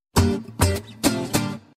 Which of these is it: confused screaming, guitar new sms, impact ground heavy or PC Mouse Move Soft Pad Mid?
guitar new sms